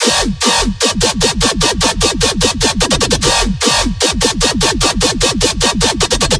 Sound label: Bass Loop F 150BPM; Dubstep; Chronic; Ghosthack - Advent Calendar 2022 - Day 18 - Bass Loops